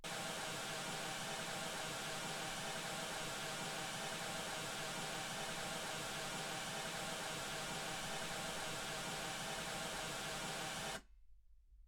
mls 48kHz

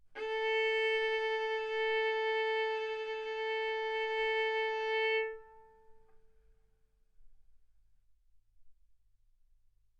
Violin arco mf sul A A4 B4 stereo

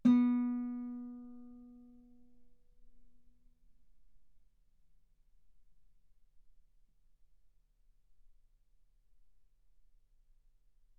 Guitar ff sul B B3

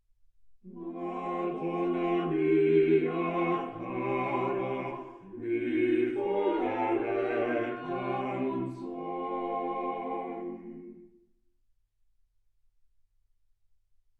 quartet

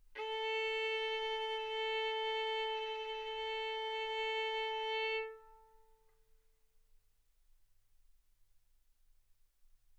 Violin arco A4 B4

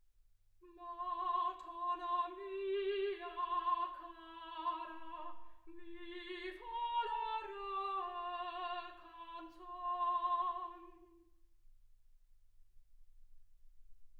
soprano

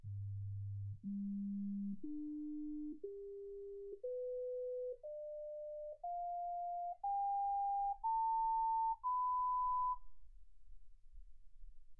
sinus tones 48kHz

